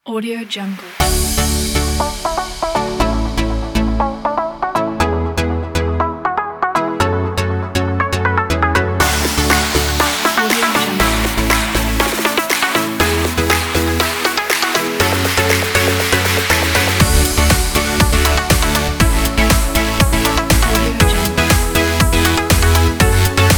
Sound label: energetic electronic pop